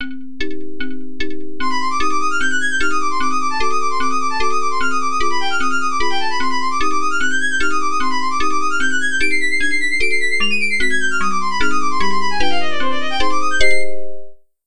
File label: chiptunes; a fly meets sudden death